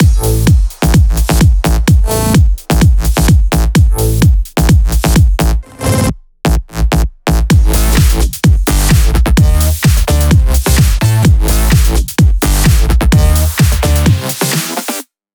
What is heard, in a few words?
128BPM The Big Groove Mix; Vengeance Samples